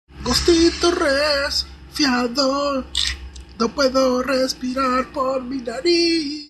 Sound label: ghosty